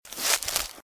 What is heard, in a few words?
Footsteps - Bush walk - Right; Eden; RadwinIsland